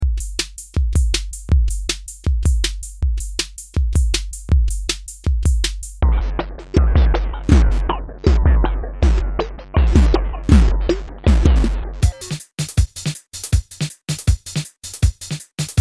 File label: sample and hold